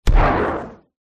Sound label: medieval fire arrow fire